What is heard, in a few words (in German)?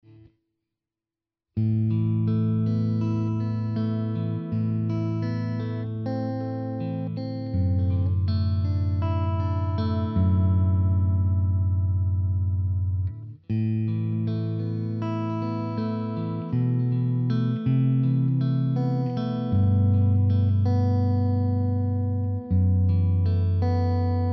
Zupfmuster, gitarre